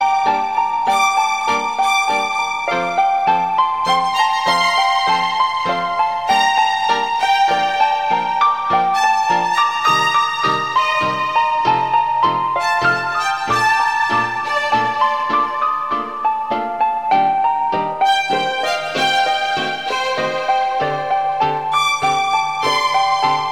Violin Trance

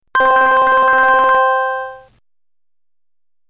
Normal Ring

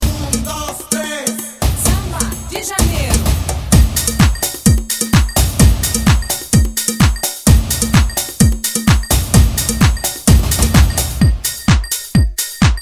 Samba Ringtone Sms

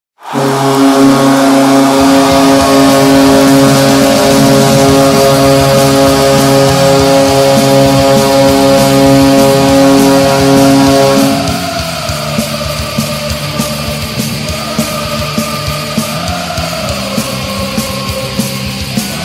goal horn

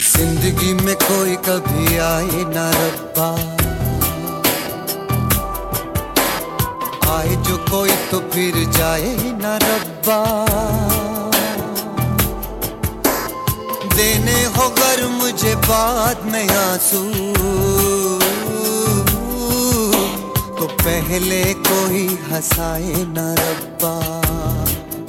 Male

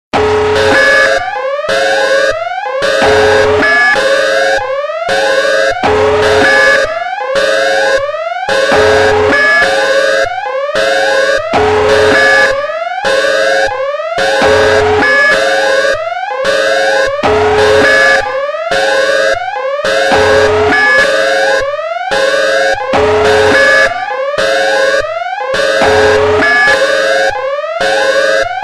Heresy Alarm

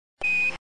Quindar Tone

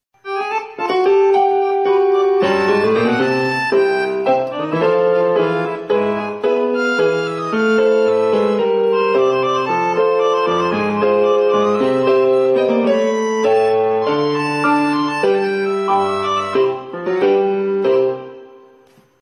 Piano improvisation

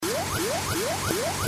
Short Siren

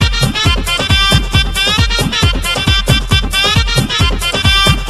Flute

